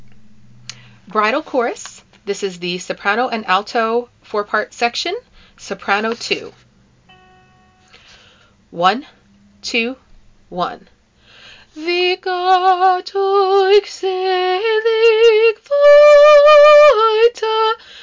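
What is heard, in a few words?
bridal chorus soprano; study tracks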